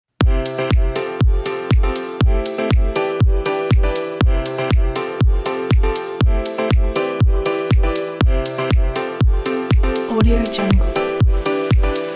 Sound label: happy summer upbeat travel